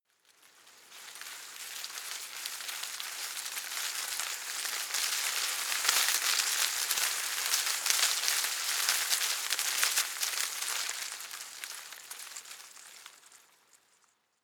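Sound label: shrub small leaves rustle heavy, garden; haus-garten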